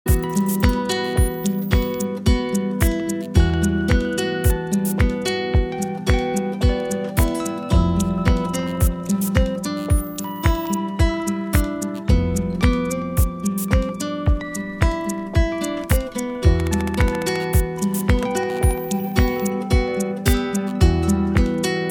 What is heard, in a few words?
tone